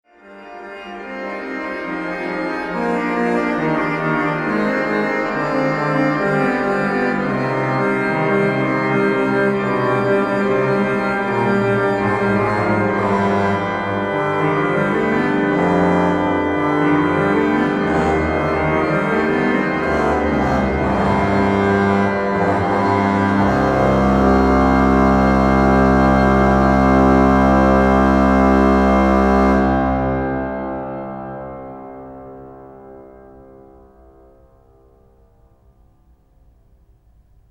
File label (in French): Basse de Trompette; Luneville; DuMage